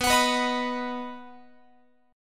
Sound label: B Major B down Guitar 4A 4E 5A 5D 5G sharp 6C sharp; strummed